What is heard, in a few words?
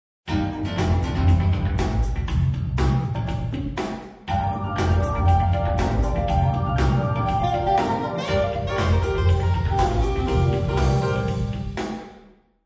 music 1000ms small room